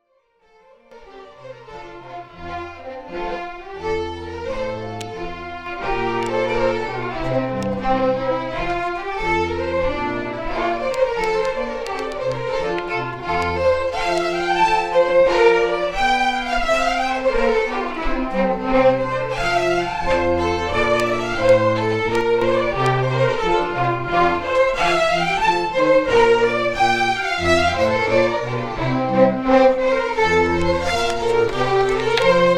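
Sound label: Strathspey, Scottish Fiddling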